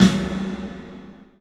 PA DEEP R, CLUB SN VRB; 3D Sound; 3D Drums

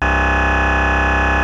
PPG C1, synthesizer; Hollywood Edge; Giorgio Moroder Rare Synthesizer Collection; PPG.2.3

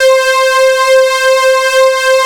Jupiter 6, Roland, Analog; USB Soundscan vol.49 - Analog Fantasies; AKAI

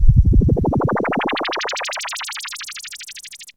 F X, PERC FX

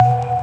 Organ Stab, Old Skool House; Big Fish Audio; OSH Chords

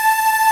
Ending loop

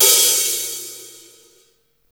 HH OP, Drum Dry; East Collexion; Drum 1

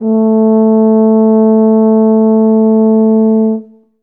tub A2, Tuba; Best Service ProSamples vol.51; Classic Orchestra 2

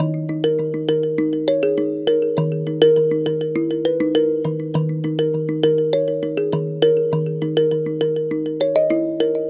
Mbira Echo; Melodic; Ambient-Melodics-II

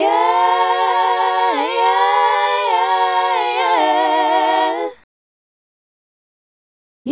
Female Group, Hip-Hop and RnB Vocals

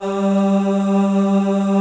aahs; Greytsounds; F2-SYNCLAV 2

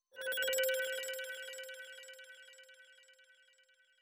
flutter, fx

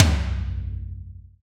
TOM TOM, Roland

